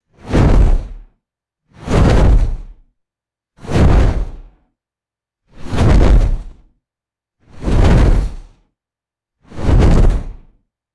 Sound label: heavy giant wings flapping